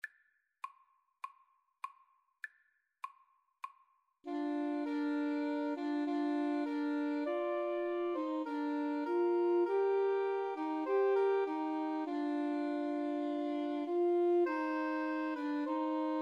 3 SAX; Woodwind Trio

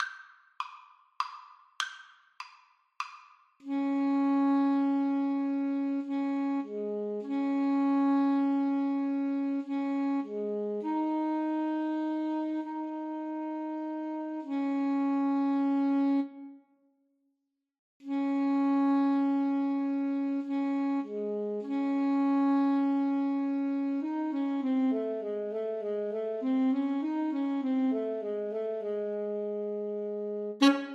Alto Saxophone Duet

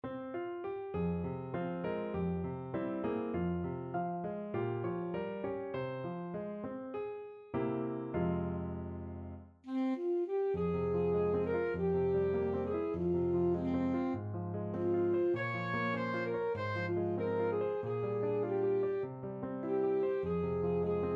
saxophone

